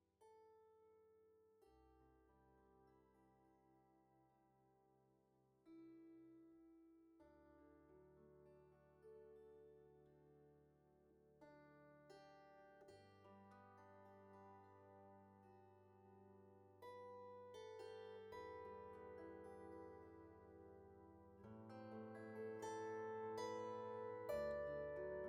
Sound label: Amazing grace Meditation, cithare